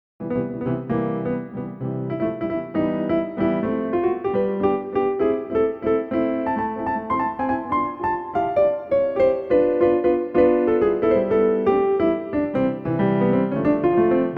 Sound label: In A Jazzy Mood